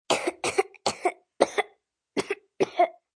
Cough Kid Sick, Cough, child, sick, chest cold (human voice)